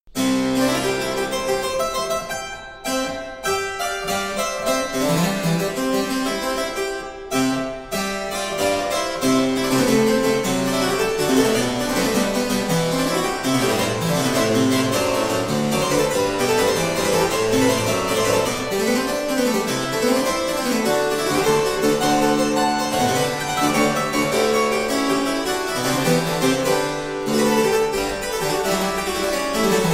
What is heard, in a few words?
Das Wohltemperierte Klavier prelude No. 5 in d major